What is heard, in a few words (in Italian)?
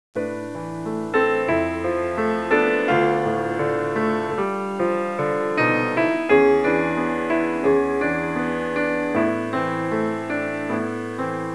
suonerie classica; musica classical